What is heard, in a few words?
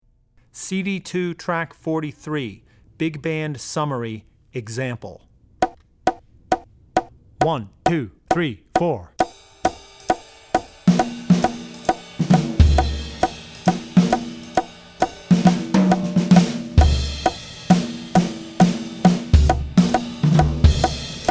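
Jazz - Big Band Summary, Drum Tracks; Drummer's Bible Tracks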